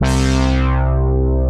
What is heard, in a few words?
hammer power drop; Synth Keys; Ableton; Creative Extensions; Poli